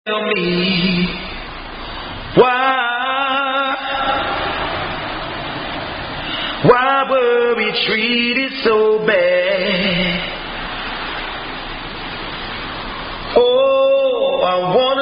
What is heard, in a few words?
Omega Psi Phi Pledge Song, Zoom Zoom Fraternity Chant; Tell Me Why